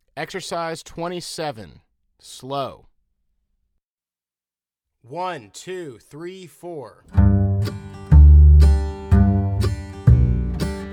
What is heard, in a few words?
Slow